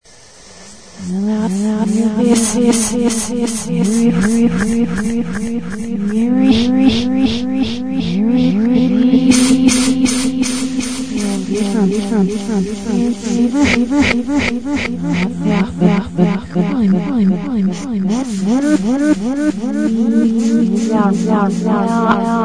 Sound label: echo trance